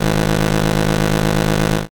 buzzer open

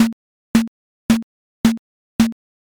mokugyo

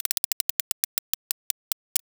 roulette

